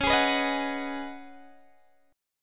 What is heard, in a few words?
Db 7th Suspended 2nd Suspended 4th, Guitar, Open G; x,18,18,16,16,16; Db7sus2sus4 strummed